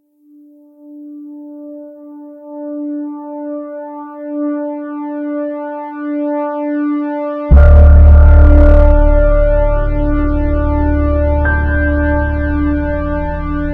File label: Lotus Piano